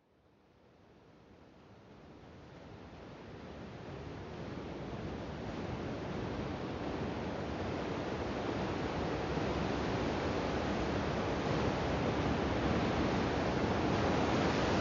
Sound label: Beach (OM), Meditation guided; Linda Mar Beach (with OM); 20 min; 20 Minute Meditations